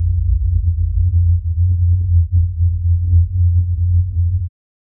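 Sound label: subtle swelling atmosphere; dissipating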